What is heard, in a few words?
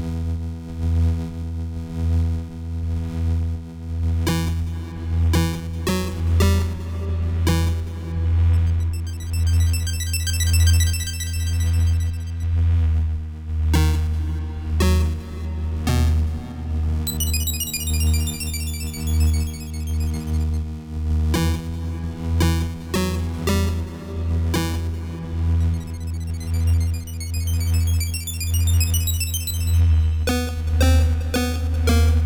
music torture loop; Skellboy